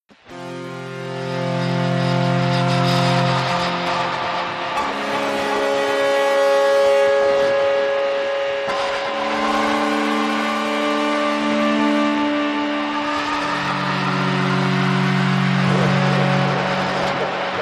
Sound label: AMB Guitar